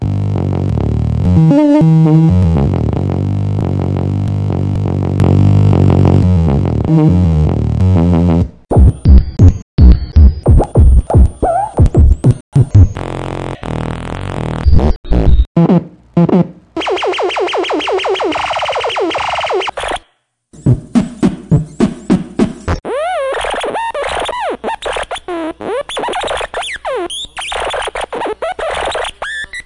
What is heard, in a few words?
Quintronics Drum-Buddy